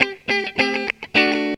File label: GTR, GTR RIFFS, Funky Elements; Zero-G